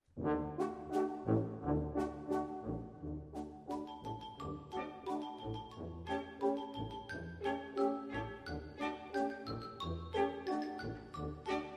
Little Polka, fanfare

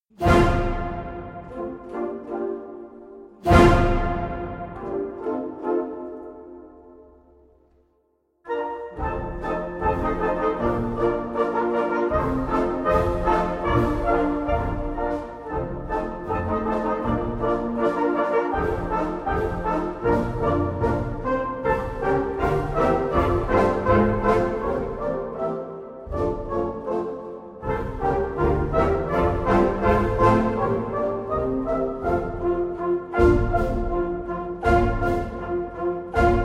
Pizzicato Polka, Fanfare

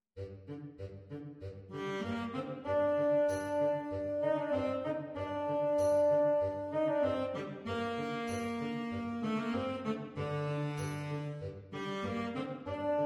Dance Orientale, Fanfare

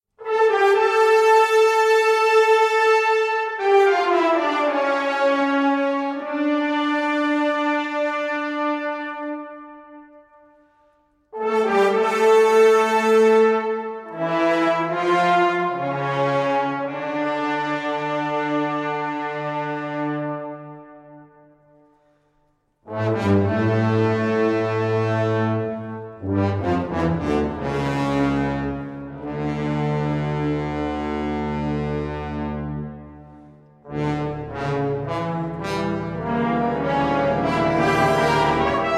Toccata in D Minor, Modern-Rhytmical - Fanfare

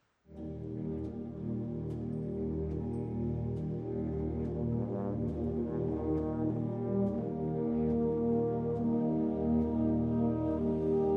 Reflection, fanfare

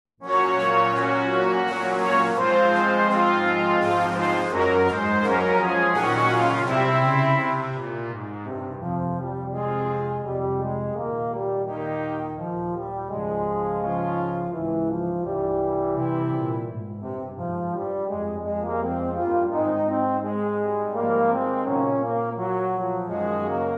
Brassband

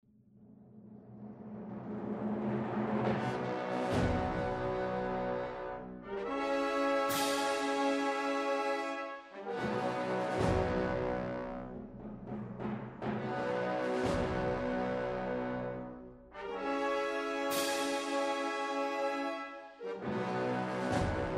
Rhapsody for Fanfare

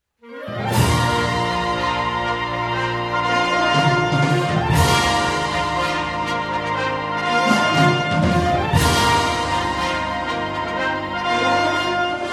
Fanfare

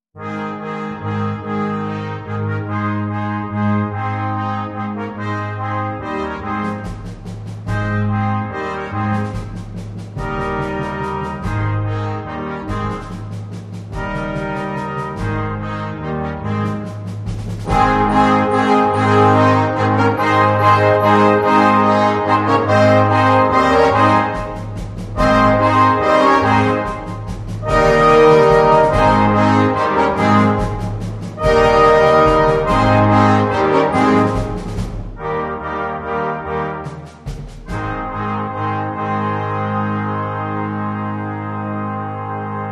Intrada Baroque, Fanfare

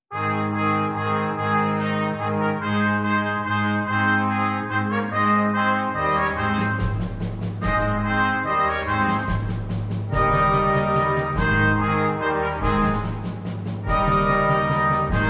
Intrada Baroque - Harmonie, blasorchester

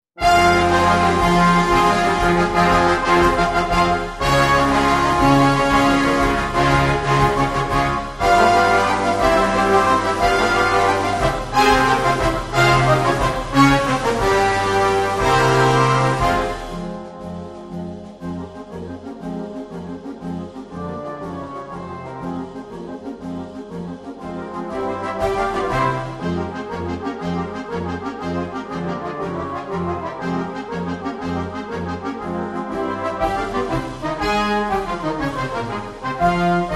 Fanfare

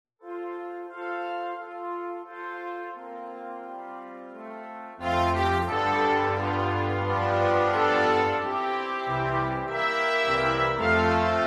Brassband

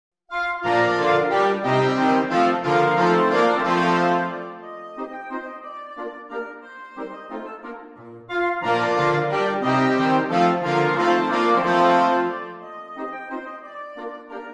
Menuetto II - Ensemble

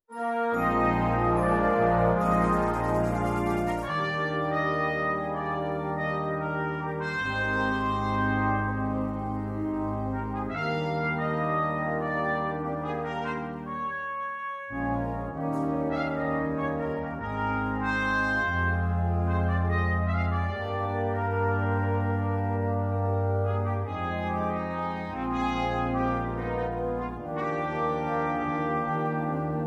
Divertimento for Trumpet, Brassband

